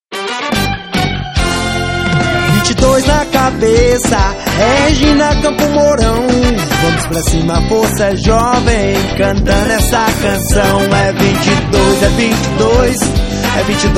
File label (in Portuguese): Jingle Politico Inedito